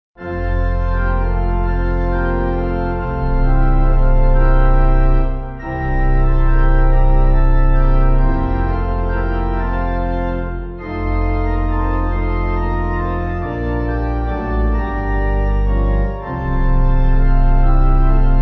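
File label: Pipe, pipe organ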